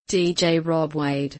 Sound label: drop